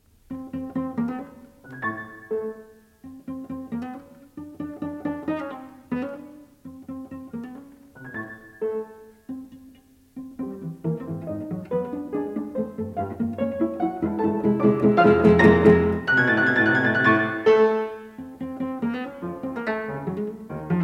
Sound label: Cello Sonata in C Major, Scherzo, Pizzicato; Britten; Op. 65 II.